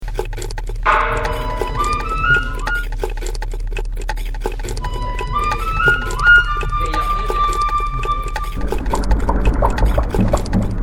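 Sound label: surround 3D sounds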